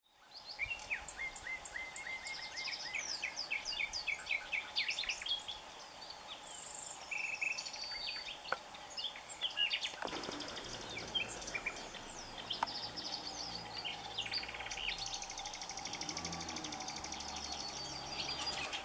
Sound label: Nachtigall mit Gesang - Nightingale singing